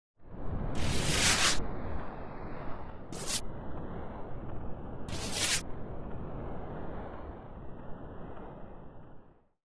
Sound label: Noisy Swirls